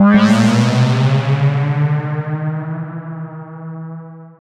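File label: Juno bass, stabs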